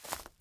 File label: foliage step